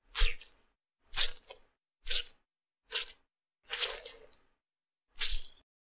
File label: sponge; اسفنج خیس